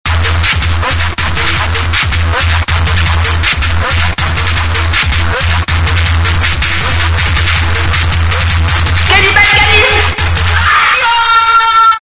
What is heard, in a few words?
jingle; virginviola